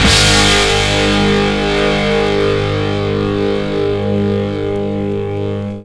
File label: INTRO guitar; Big Beat Samples & More; AKAI